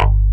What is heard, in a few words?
BASSES; Pro-Rec - Synthsonic